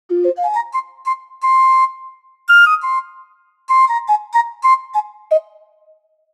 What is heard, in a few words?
Solo Flute